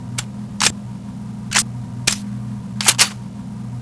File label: reload; custom weapons; cso; vulcanus3